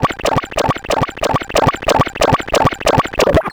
Bent Talking Teacher, Circuit Bent

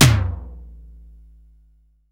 SDS7 KIT, Elec. Percussion; E-MU Producer Series Vol. 2; More Studio Essentials; Composer

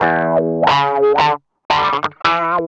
GUI 90 LICKS

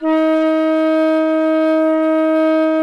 traverse E4; SoundBytes - Early Patches

